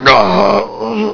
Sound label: DYING; BattleSNDS